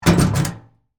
Trash Bin Lid Open, Industry, Trash, Bin, Lid, Open, Metal, Large; Garbage